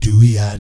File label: DOOEEOT, Vocal Jazz Fx